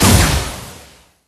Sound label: Spell Impact; Level16 Veznan